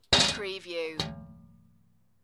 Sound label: Sewer Cover Up-Down